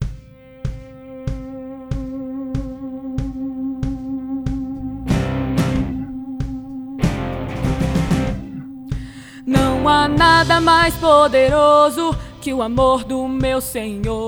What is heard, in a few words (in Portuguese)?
soprano